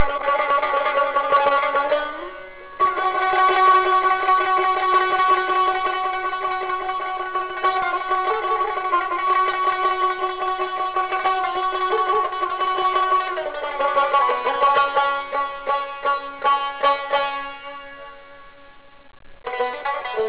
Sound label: Bayati Shiraz